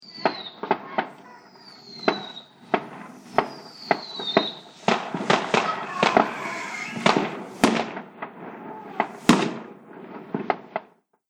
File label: Fireworks Many Explosions With Small Crowd; Firework Sound Effects; Celebration, Party, Holidays, Birthdays